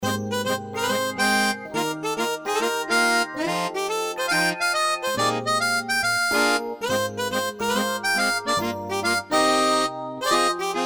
Humorous; ZTE; WP750

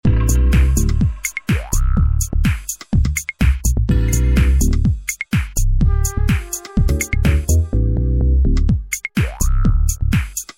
Bee; LG GD910; Ring tones

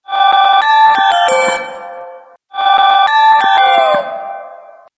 Chrome Ring, Motorola; Karma-QA1

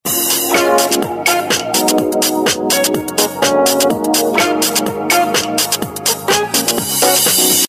Ringtone, Samsung GT-S3310

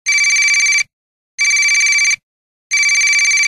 Ring Standard

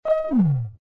Low Battery, Motorola W755

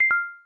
cam stop; LG; Optimus L5 E610v

